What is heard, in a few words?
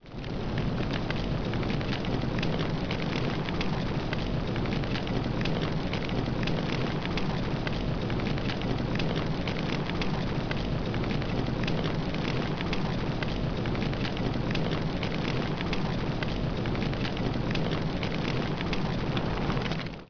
fire alt, rifle; q rifle